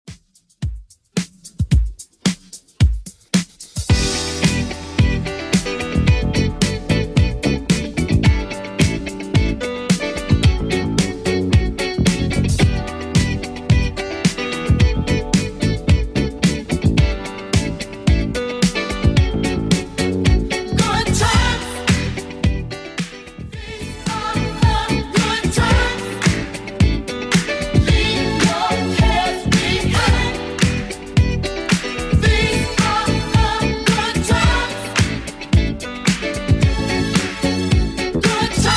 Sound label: 70s Redrum 110bpm